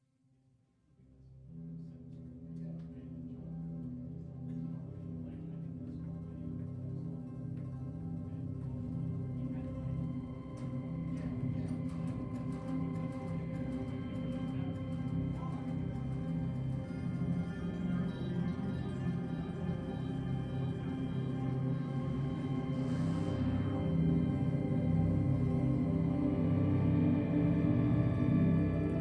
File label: ghost noise